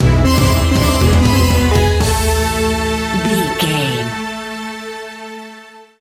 middle east ambience stinger, An exotic and colorful piece of World music. Groovy, powerful, exotic and wild